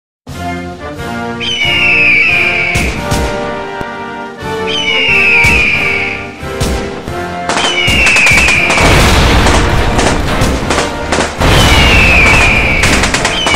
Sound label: usa anthem but with gunshots explosions and eagle screeches